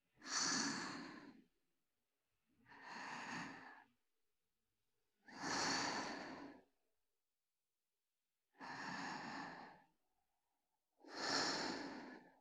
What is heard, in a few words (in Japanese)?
寝息; SEなし